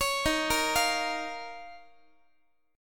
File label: D sharp 7th Suspended 2nd, Guitar; D#7sus2 arpeggiated; JGuitar